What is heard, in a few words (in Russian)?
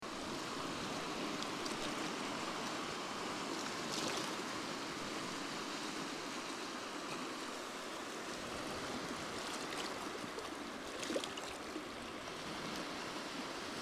okean shum